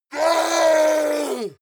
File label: yeti attack shout aggressive painful; schreie